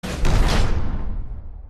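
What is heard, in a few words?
sounds of endings outro, disappearance fading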